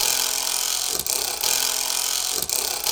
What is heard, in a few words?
MACHINES